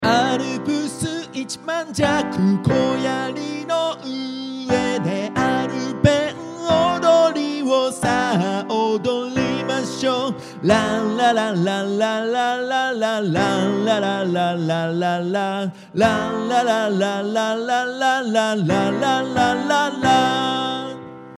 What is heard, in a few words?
piano